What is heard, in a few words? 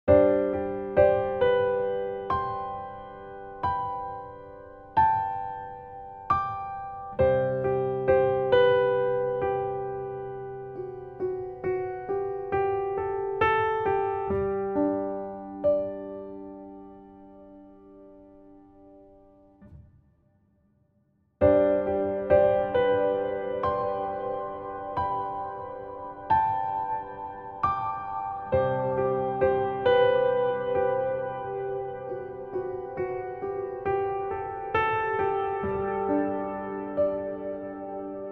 PIANO Grand Sanctuary